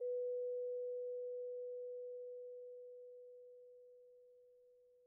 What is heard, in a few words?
Vibes soft B3, Vibraphone, Soft Mallets; Struck Idiophones